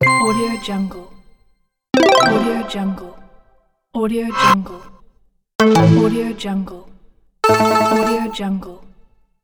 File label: gui buttons fx